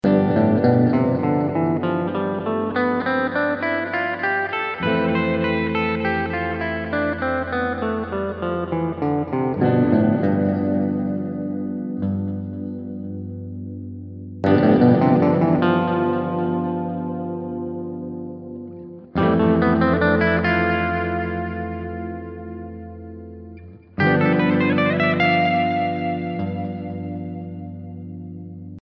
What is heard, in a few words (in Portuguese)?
Modos Gregos Sol Mixolidio